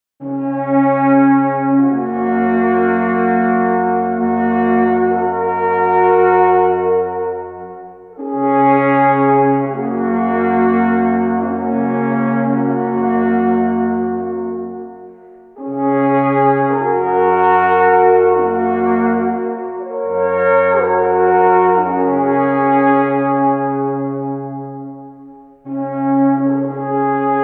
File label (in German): Volksmusik